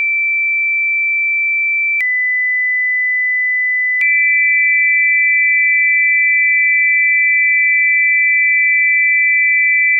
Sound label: Dual Tone